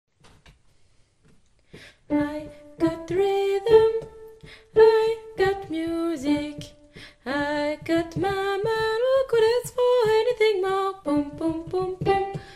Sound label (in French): soprane